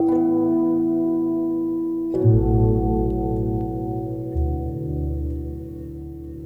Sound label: Gong Song; Sonic Foundry (Sony Creative Software) - Friendly Fire